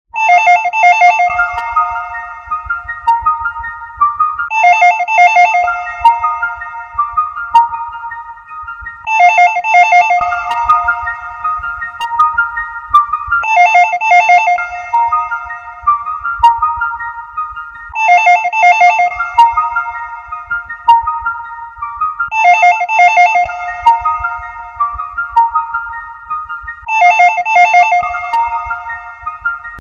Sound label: voip Invite